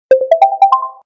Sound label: front camera rhythm up; Allview Soul-X6-Xtreme